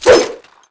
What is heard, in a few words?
Orc Atk